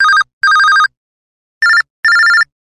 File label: Moving Bell, phone tones; LG A250